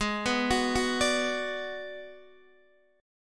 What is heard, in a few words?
G sharp Minor 7th Flat 5th, Guitar DADGAD